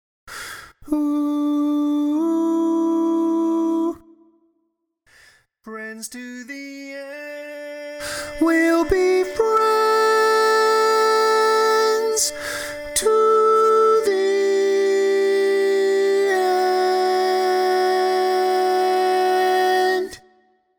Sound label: Tenor, barbershop tag